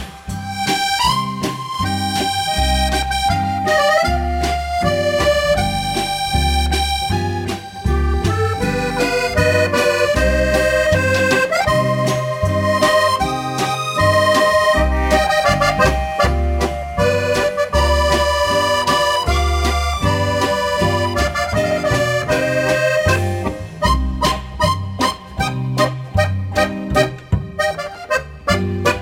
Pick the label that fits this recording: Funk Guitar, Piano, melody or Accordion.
Accordion